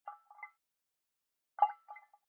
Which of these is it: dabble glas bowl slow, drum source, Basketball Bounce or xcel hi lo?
dabble glas bowl slow